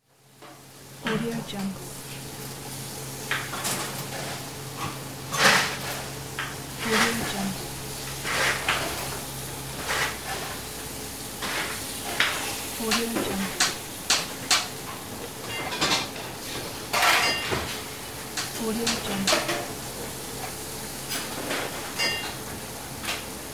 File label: kitchen ambience